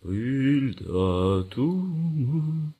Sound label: humming, stalker